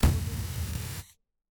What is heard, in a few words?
scifi implosion